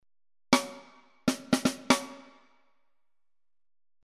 Snare; DRV2

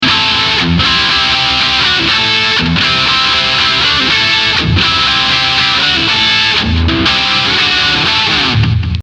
Boss ME-70 Lead Stack

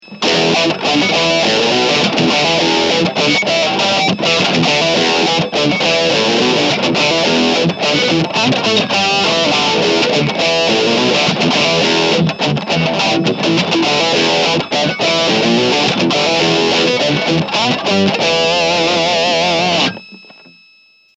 Octaver

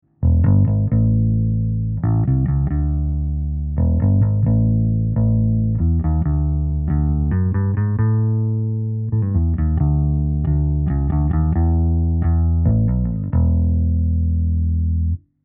Rupert Neve Designs 517 Bass Amp U47fet

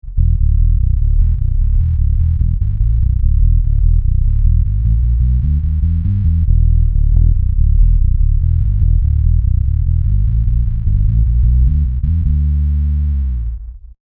Darkglass Microtubes X7 Low Band passive

